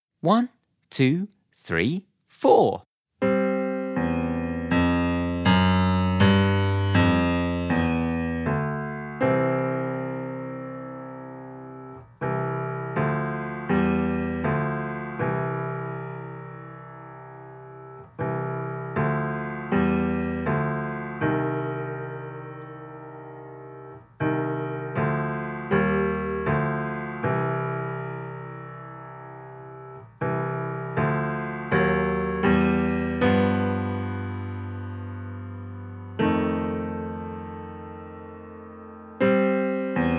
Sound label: Walking Blues Playalong